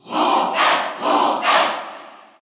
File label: Cheer Russian; Lucas; SSBU